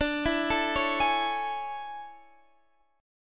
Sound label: A Major 7th D; AM7/D; arpeggiated